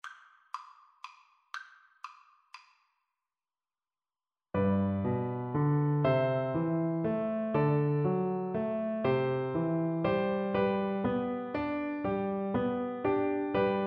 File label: Double Bass